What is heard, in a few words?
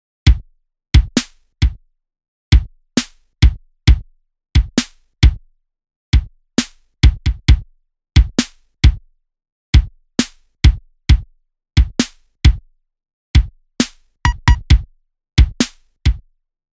lofi drums; wildcat gun machine ps4 switch windows xbox one gamerip 2022